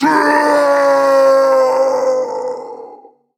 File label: Falling; Lazarus